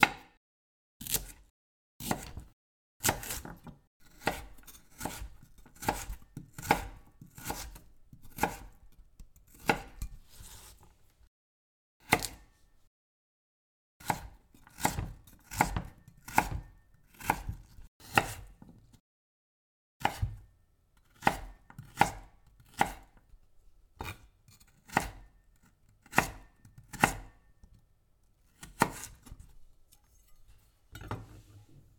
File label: Cutting A Carrot